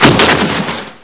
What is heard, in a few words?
thuds